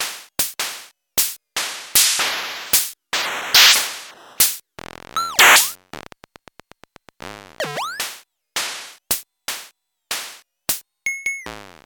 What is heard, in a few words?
drum pads pitch; kosmic shade